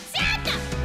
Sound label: Scream; Zeta; IRyScream